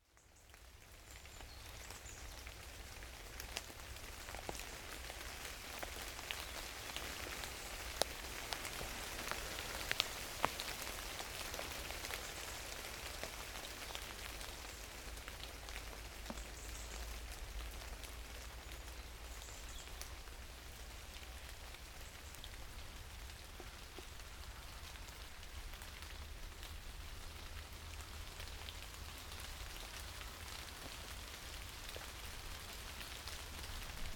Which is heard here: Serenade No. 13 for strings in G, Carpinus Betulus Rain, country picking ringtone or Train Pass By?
Carpinus Betulus Rain